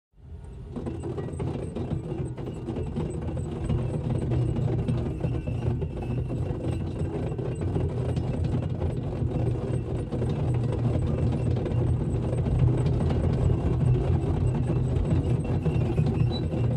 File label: Container rolling on floor